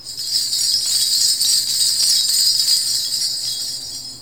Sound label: coin